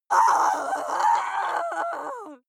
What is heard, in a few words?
female lunatic scream; menschen; schreie